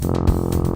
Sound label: cat noise